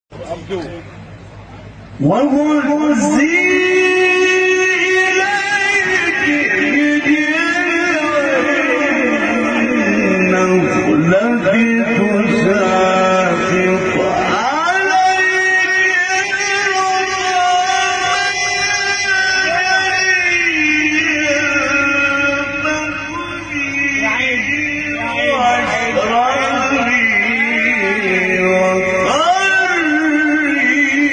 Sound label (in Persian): تلاوت آیه سوره; مریم; استاد طنطاوی